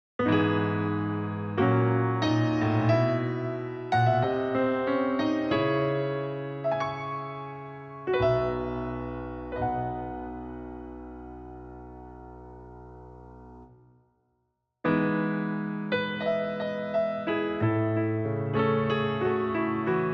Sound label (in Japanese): ピアノBGM～Silent Night～; 劇中ピアノBGM